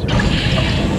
Mess Door Open; trek

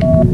End Ship Comm; trek

